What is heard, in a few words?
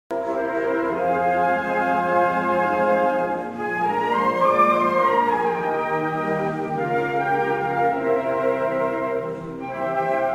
consert, flute; arietta-flute